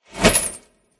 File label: Cinematic Metal Whoosh